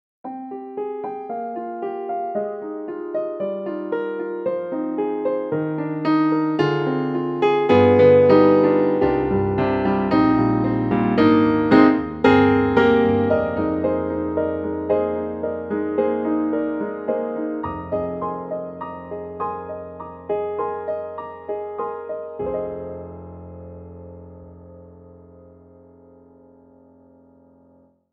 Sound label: Piano; KORG; KRONOS 2